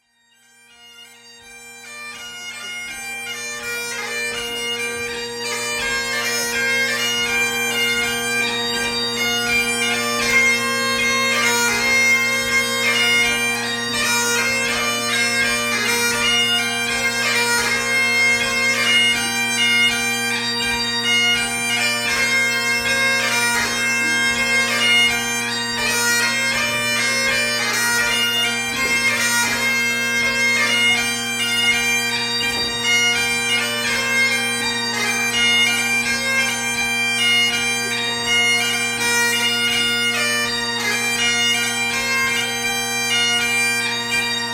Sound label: piper